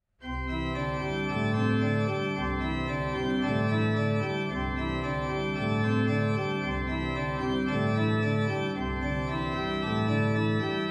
die glocken, organist; Andreas Willscher; bergerac

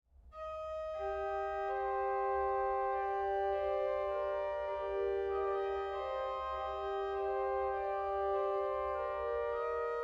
basse de cromorne, organist

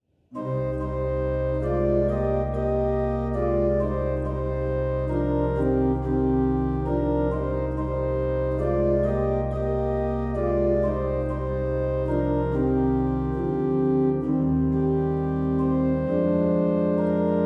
improvisation c minor, organist

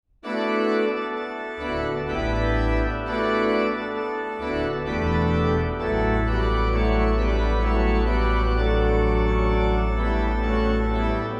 fanfare postlude, organist

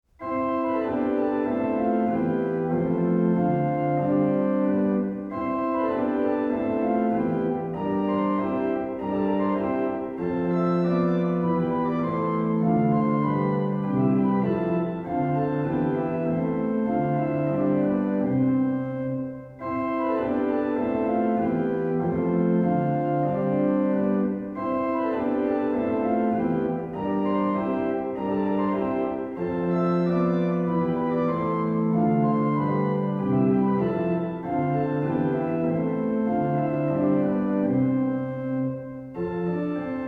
floten solo, organist; Andreas Willscher

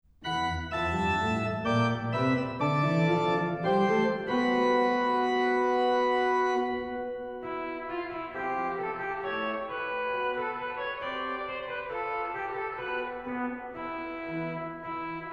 organist; Douglas Bell; snetzler

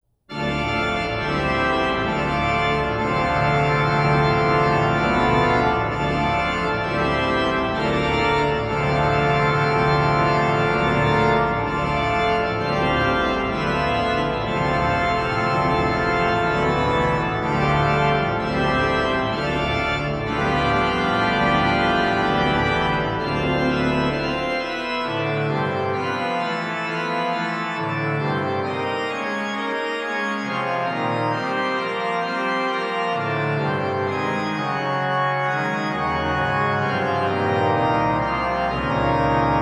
elegisches carillon, organist; Andreas Willscher

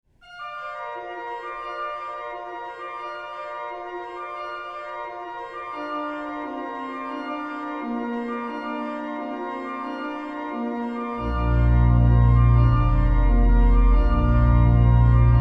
carillon toccata, organist

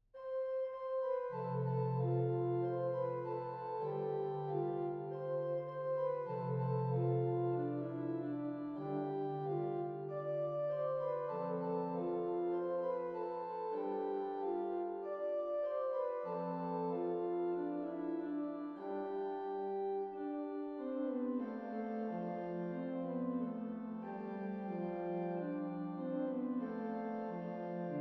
flute tune, organist; Variations on a Flute Tune (2019); Michael Blake

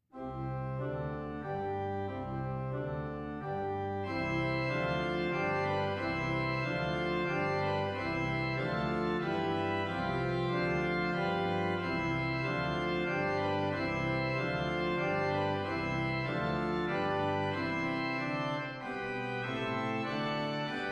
die glocken, organist; Andreas Willscher

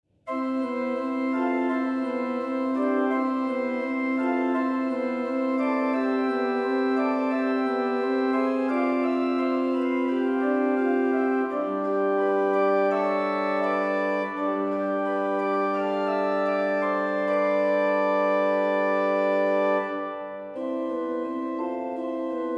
preludium c major, organist; steinsland; organist online recordings

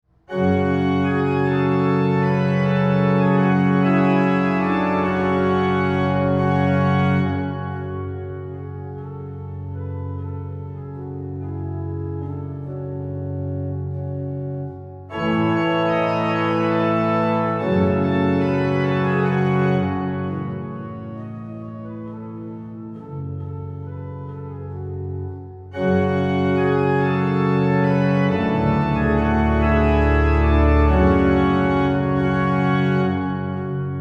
echo fantasie, organist; Andreas Willscher

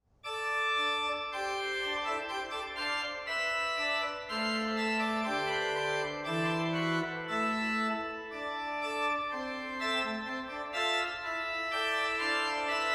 organum, organist

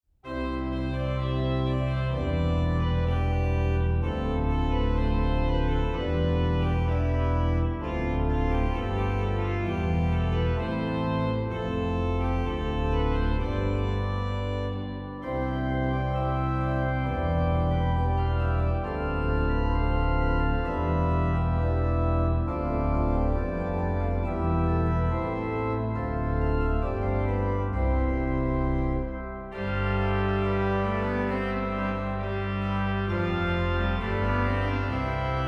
trumpet tune in c, organist

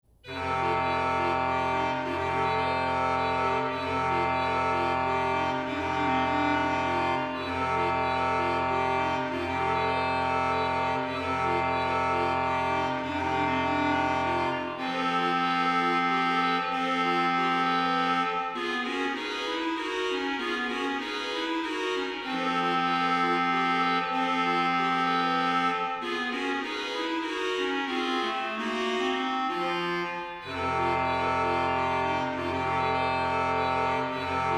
musette, organist; Andreas Willscher

